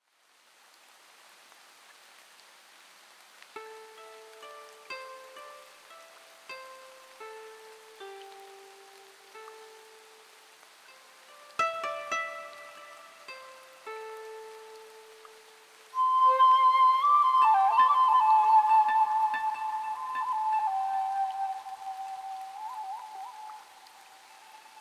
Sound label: the temple ambiance, music